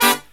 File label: low chord, brass riffs; USB Soundscan vol.29; AKAI